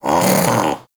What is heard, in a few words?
Armadillo Vocal Alerted; Warframe; Characters; Hunting Critters